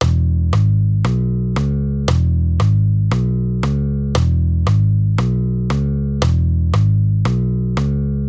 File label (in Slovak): Figura tony E1 Gis1 H1 Cis